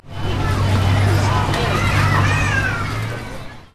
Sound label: town noise